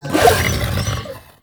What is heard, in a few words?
Pain Growl; Warframe; The New War; Archon; Amar